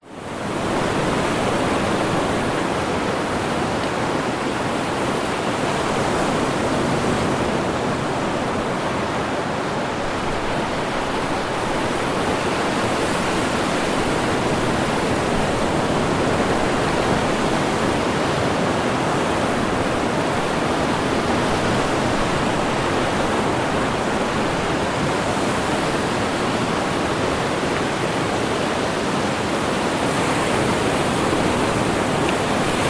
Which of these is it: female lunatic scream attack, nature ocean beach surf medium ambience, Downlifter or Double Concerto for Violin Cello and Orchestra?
nature ocean beach surf medium ambience